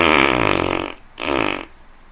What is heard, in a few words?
fart smelly poop